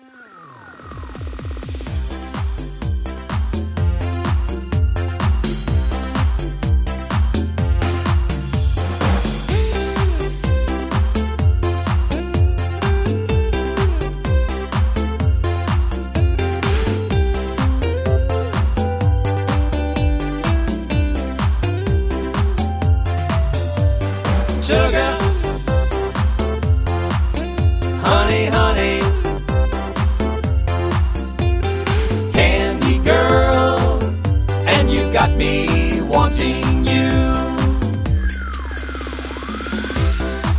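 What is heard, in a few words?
Instrumental with background vocals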